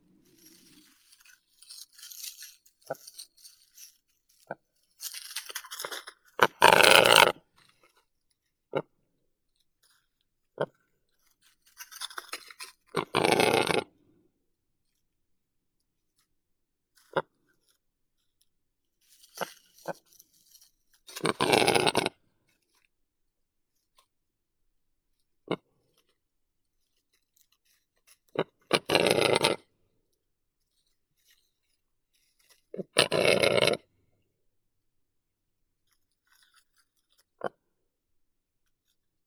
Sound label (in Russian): gluhar tetrao urogallus